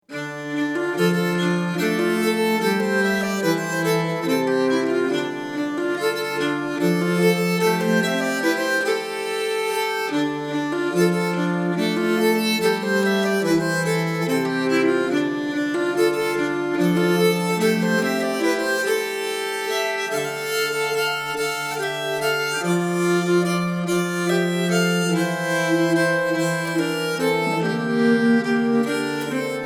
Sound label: Vals, nyckelharpa; Mors